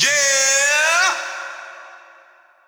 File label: yeeeeeeah